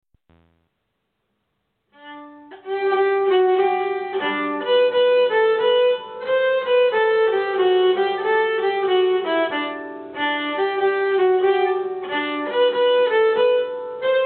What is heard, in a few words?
mazurka, nyckelharpa